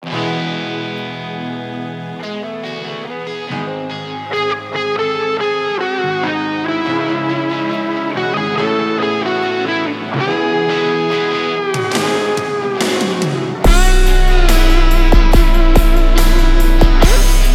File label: 31-35hz Low bass